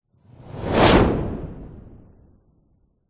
sound design whoosh transition swoosh med powerful